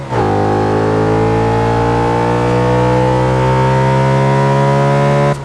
third, vehicles; gmod; tdmcars; rs4

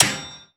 frag physics bounce metalgrate hard; gmod; viper

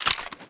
reload fast xmag mag out; gmod

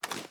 kilo98 reload fast cloth; gmod; viper

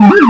nypd siren; gmod; lwsirens

